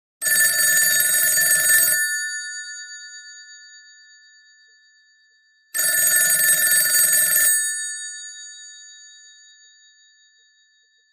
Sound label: telephone